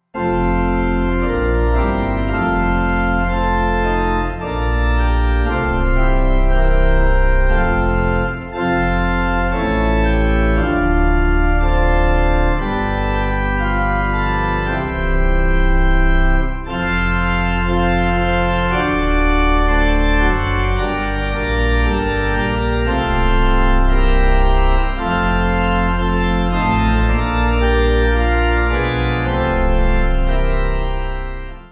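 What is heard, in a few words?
Pipe, Pipe Organ backing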